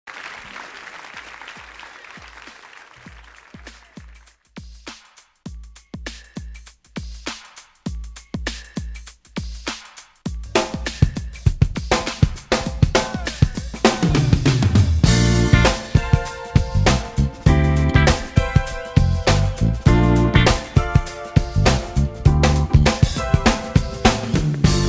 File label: Rio Funk